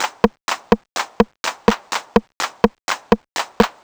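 perc tick, Classic Chicago House Percussion Loops, 125 bpm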